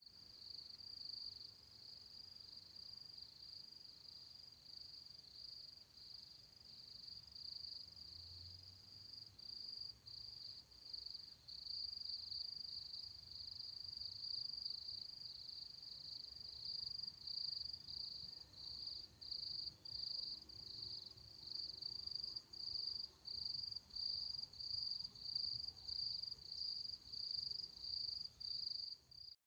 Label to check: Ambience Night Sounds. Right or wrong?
Right